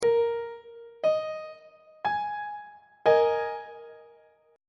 g sharp suspended 2nd 1st inversion on treble clef